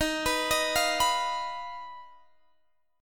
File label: Eb Suspended 2nd Sharp 5th, Guitar, DADGAD; arpeggiated; x,18,21,20,20,21